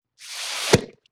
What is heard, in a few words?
Wet Squoosh Pop, Vintage Cartoon